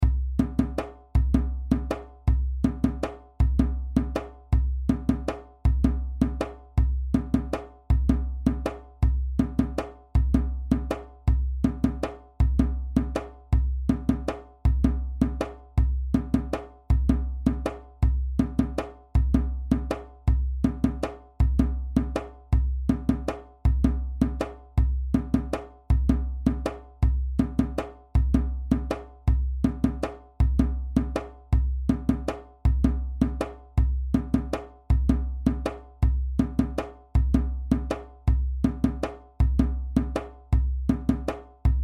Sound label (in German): Kakilambe groove 80BPM, djembe